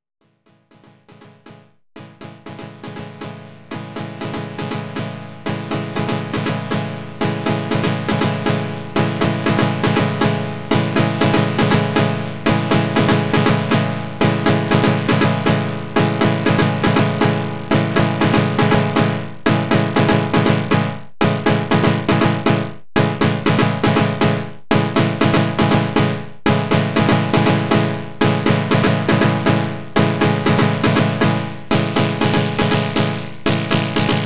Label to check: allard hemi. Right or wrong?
Wrong